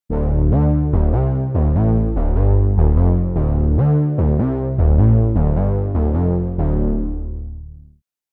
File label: Bass; opxpro2